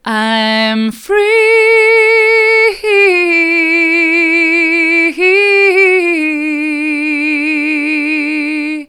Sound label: mid, vocal